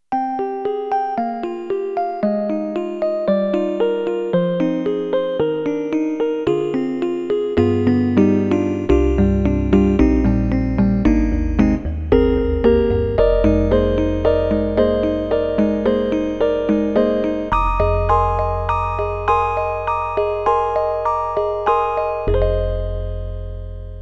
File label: CASIO GZ-50M ELEC PIANO